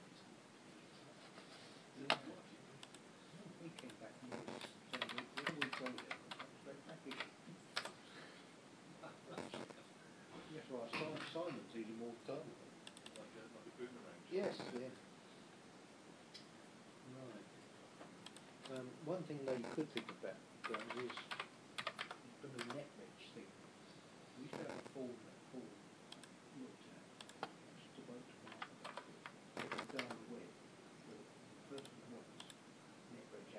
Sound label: office sounds